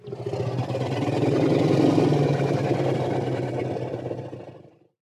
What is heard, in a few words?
African elephant growling